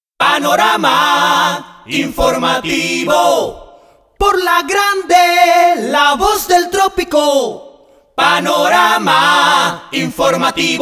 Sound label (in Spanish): Voces; Panorama Informativo